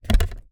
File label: Click In, DVD Drive External; DVD Drives